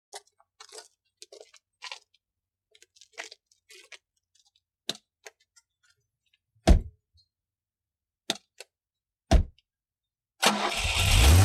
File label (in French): Voiture Couple entrant dans la voiture pas ouverture et fermeture de portieres; allumage